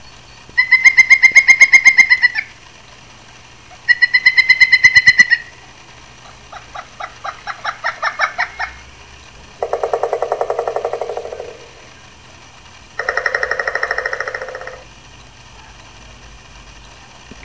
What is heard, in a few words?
Pileated Woodpecker